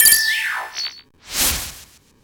complete

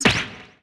damage; mystery room

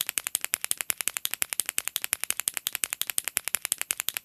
pen clicking

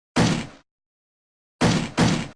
breaking; franziska

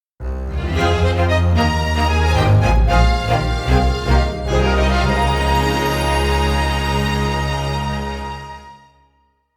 jingle ~ opening

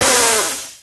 spit take

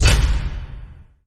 magic spotlight; bonny betty

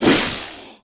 snake angry

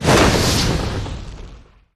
damage wind; bonny betty

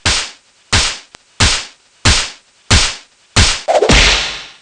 falling; knight

